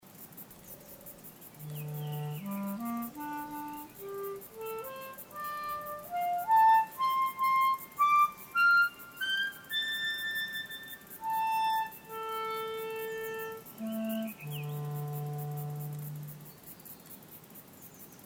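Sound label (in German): Melodic Quinten Richtungen Echo Roll Free Spiel Vogel Wind; Kriti Minos; kreta; Minos Nord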